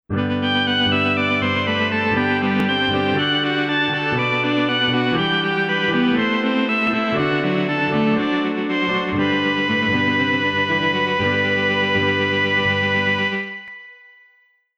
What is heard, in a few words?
Strummed; CA-Solovox